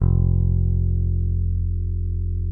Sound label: P BASS SUSTN MP F1; Sampleheads; Will Lee Bass Library